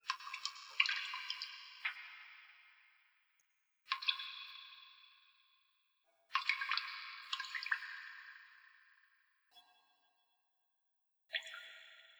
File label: ambience sewer